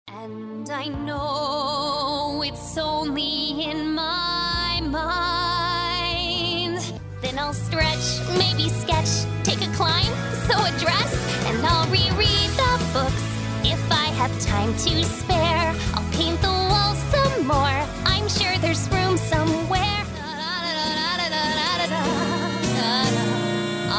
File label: American English singing